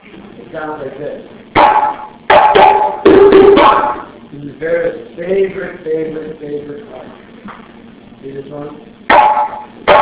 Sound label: second accompaniment